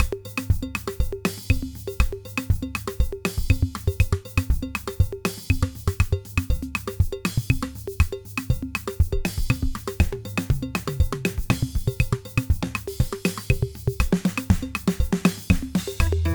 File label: Local Drums; L4C STUDIOS